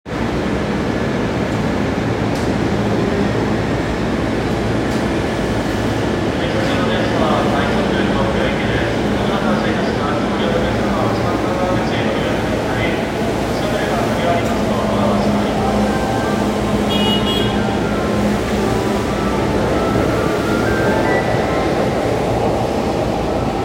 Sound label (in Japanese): 中央線快速中野東, train sounds